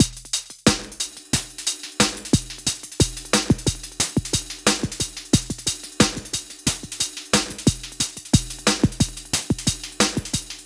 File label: groove; PLUTONIUM; FRENETIC FRACTAL GROOVES